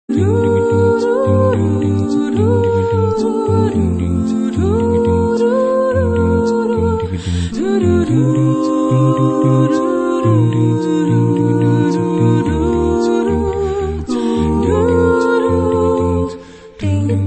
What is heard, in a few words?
a cappella; Human Race subtune 4